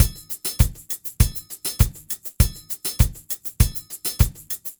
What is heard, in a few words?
Ensemble, Latin Percussion